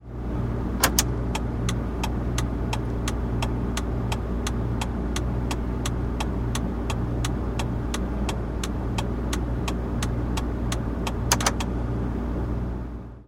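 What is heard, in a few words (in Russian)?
звуки поворотников у автомобиля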